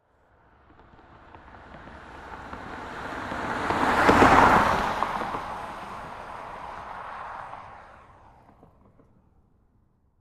Car Pass By, Transportation, Automobile, Car, Pass, By, Car, Stereo; SoundEffects-BlastWave